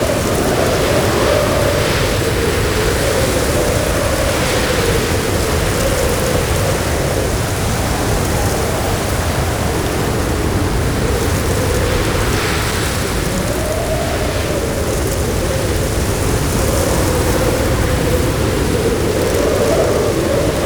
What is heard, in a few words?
Sandstorm Loop Local; Warframe; Mummy